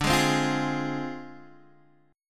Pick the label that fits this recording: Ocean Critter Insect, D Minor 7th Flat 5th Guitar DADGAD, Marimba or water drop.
D Minor 7th Flat 5th Guitar DADGAD